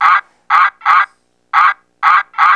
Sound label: duck